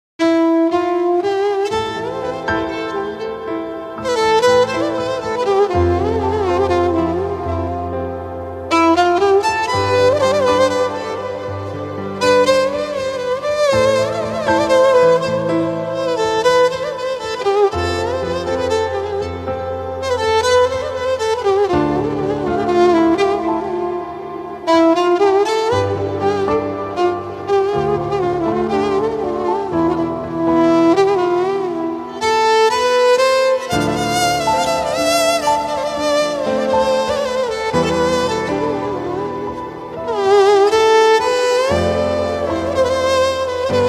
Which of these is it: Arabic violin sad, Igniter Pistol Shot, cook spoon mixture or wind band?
Arabic violin sad